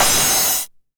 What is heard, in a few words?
909 CYM Tape Sat; Goldbaby Tape808 & Tape909; The Tape 909